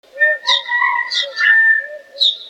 Birds Various

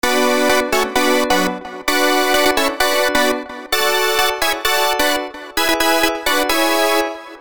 Glass String

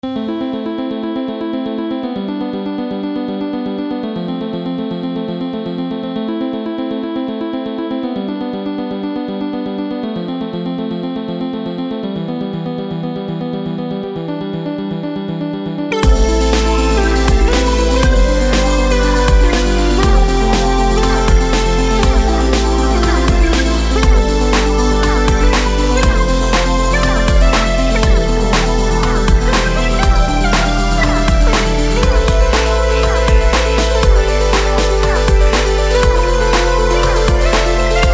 EDM melody

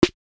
deck snare

